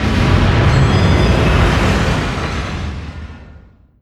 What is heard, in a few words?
distance super heavy battleship attacked; Hearts of Iron IV Death or Dishonor